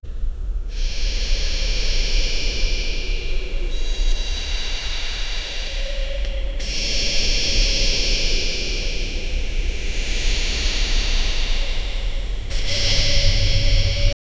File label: the looping sound of demo, demon